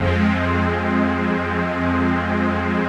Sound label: CHRD PAD; Best Service; XX Large Pads; Sample CDs